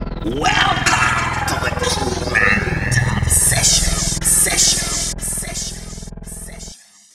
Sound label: startup